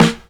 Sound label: sharp acoustic snare sample a sharp key; snare drum samples